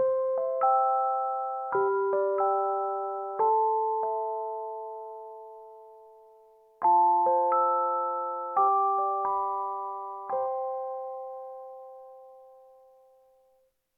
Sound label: Calm, alarms; Yandex